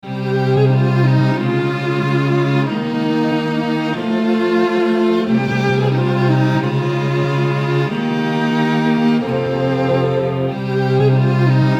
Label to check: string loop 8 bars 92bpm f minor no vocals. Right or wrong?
Right